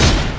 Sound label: smash